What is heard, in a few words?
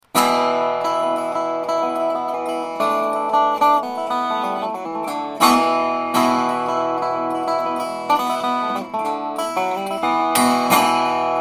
resonator banjo; dobro banjo